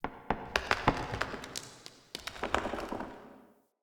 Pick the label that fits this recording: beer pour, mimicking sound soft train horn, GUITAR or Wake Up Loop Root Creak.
Wake Up Loop Root Creak